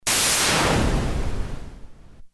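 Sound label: sweep noise; nord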